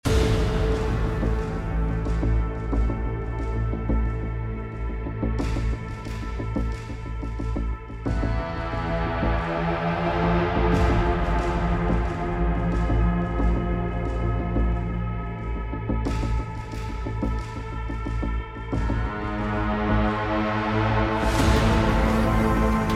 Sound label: music full right